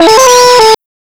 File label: Funky Riff; Sonic Foundry (Sony Creative Software) - Rondo Brothers Trip-Hop Loops & Samples; Liberation